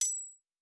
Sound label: Jewel UI; Fantasy Sounds Bundle